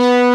B-2, GUITAR; AKAI S-Series CD-ROM Sound Library VOL-8

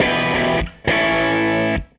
Gretsch Guitar Loop

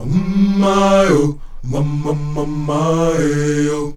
UM MAY, Afro Chants

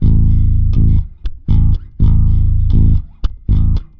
Rock, Bass; Bass X

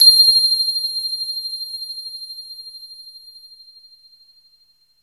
JV bell, JV-1080; Syntec; Wall of Sounds VOL-2; BASIC-JV1080

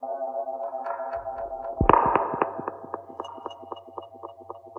Bypass Duct, Environments; Sonic Foundry (Sony Creative Software); Myths of Technology

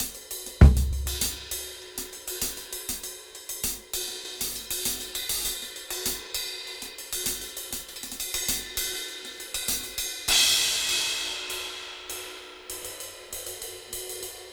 KL Beat 98 BPM Cym; AMG - Keith LeBlanc Phat Butt Jams

